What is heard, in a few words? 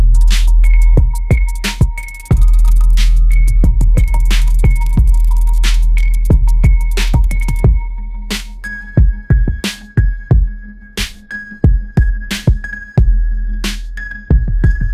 dark trap beat; ai-generated